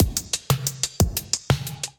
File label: Brand New Beats Kit, Drums; Ableton; Drive and Glow